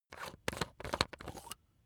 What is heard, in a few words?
razor battery compartment screw open; maschinen; rasierer